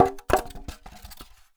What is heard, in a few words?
Polystyrene Rattle